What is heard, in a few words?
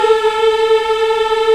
VOX African; Roland LCDP11 Africa VOL-1